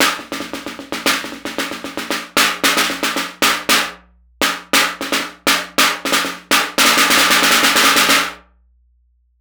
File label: 114 Fife N drum Snare